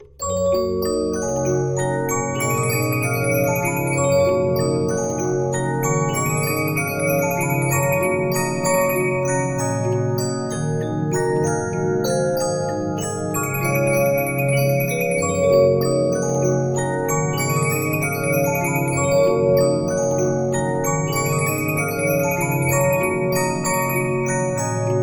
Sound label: Regina, 15inch disc musicbox